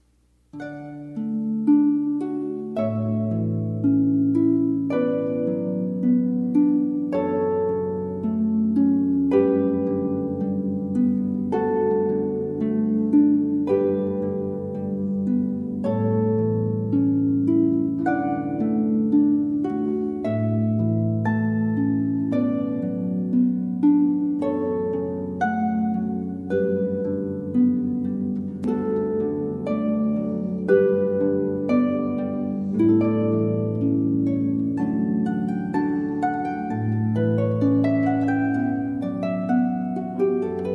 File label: Canon in D Pachelbell, Harp; Larisa Smirnova